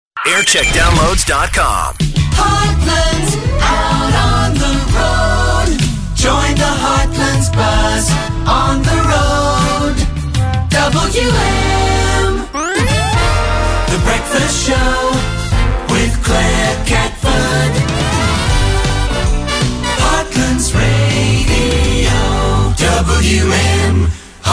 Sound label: Jingle Montage; Radio WM Heartlands; Jingle Montage (JAM) 1989; BBC Radio WM; 1980s